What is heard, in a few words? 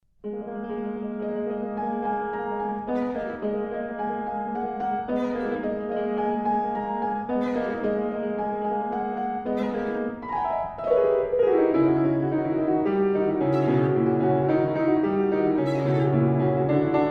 Violin Sonata; Janacek